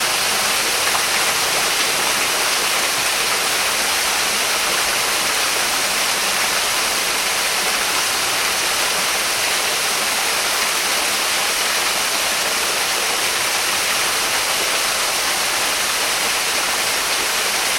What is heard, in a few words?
waterfall small; sound effects one